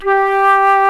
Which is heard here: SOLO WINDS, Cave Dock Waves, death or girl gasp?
SOLO WINDS